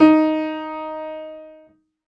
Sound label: Piano, Harmonic